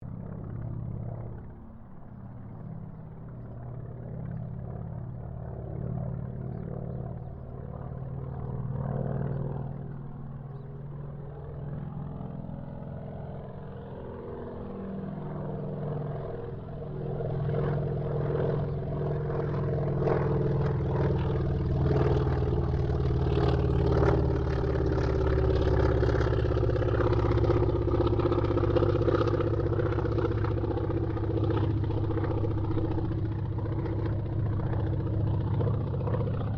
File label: AIRCRAFT CAC WIRRAWAY PASS; CA-16 Wirraway; Airplane; Airplanes; Vehicles